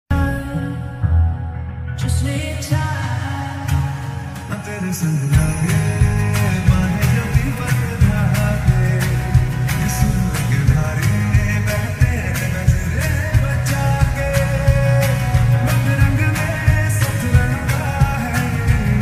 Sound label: background music bgm ringtone; vlog music